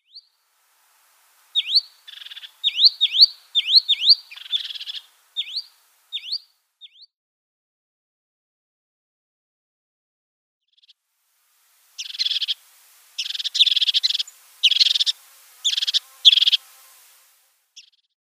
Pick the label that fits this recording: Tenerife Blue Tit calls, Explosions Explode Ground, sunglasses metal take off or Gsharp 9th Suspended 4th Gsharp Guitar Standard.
Tenerife Blue Tit calls